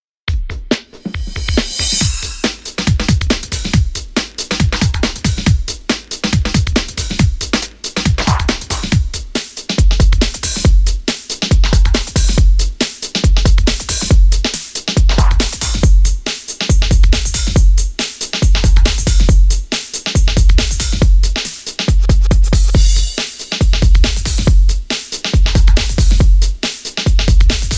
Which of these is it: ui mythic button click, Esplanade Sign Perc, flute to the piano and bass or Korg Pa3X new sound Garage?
Korg Pa3X new sound Garage